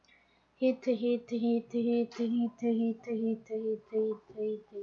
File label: hit